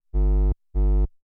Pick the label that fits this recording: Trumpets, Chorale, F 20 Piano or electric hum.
electric hum